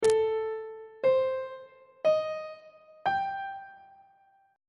b flat major 7th chord 7 is a half diminished 7th on treble clef